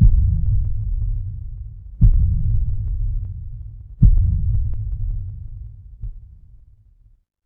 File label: horror heartbeat